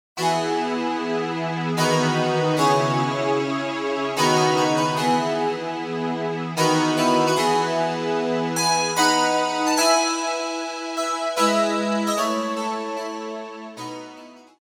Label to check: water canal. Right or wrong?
Wrong